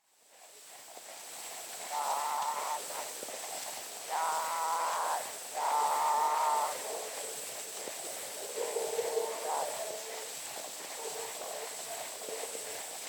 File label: Zulu Recordings; Father Franz Mayr